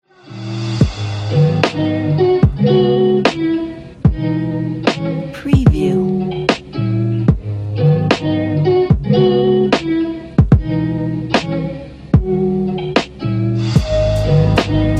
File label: beat hip hop